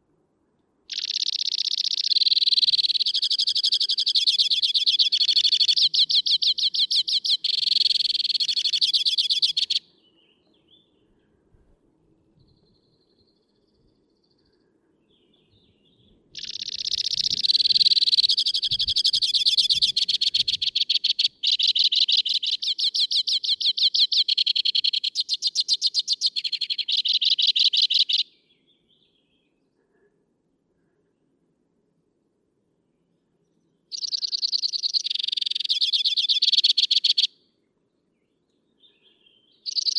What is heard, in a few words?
Crossbill Wh Wing